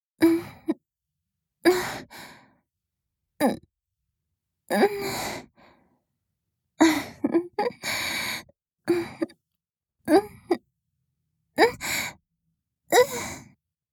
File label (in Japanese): イス洗い時の息漏れ; 実用ボイス抜粋集